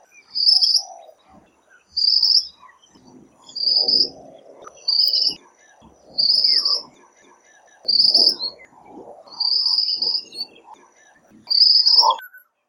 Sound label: Ashy or Swinhoes Minivet, Ipoh Perak Malaysia